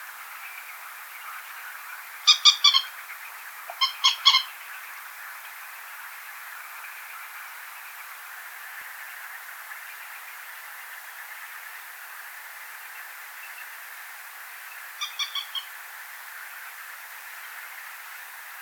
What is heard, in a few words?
common moorhen gallinula chloropus